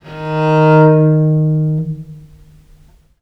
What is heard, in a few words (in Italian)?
D#2, Historical Instruments, VIOLE MUT; USB Soundscan vol.23; AKAI